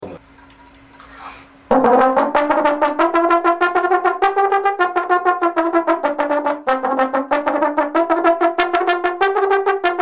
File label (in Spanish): Doblepicado